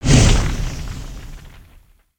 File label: Spinjitsu Fire Swing